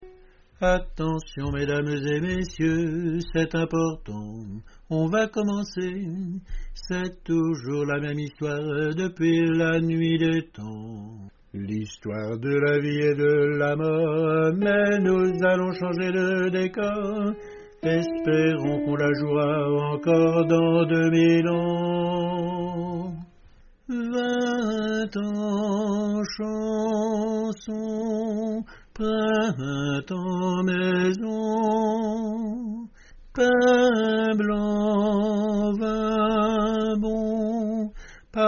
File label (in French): basse